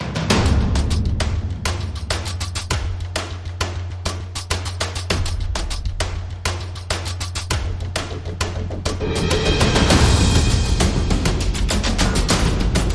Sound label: Drums and SFX